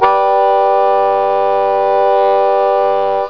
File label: harmonica